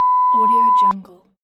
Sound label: a censor beep